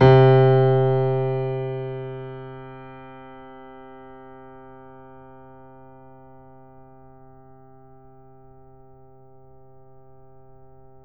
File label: Grand Piano C2 f